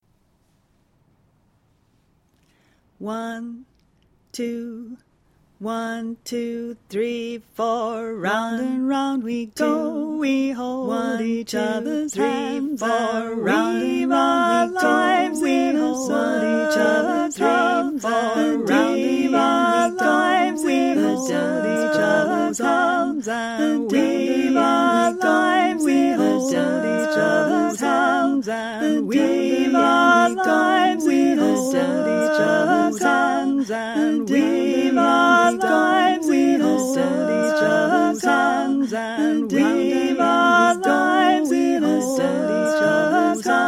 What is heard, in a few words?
trio